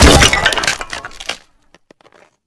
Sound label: door Blast; Figure